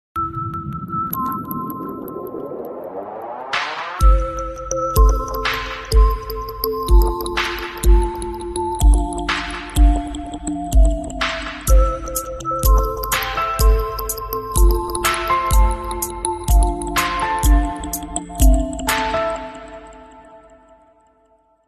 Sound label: Mobile Ringtone